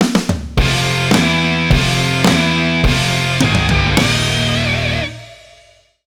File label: End Game Lose; NFL Rush Zone; Leapster Explorer